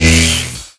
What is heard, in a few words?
slash elect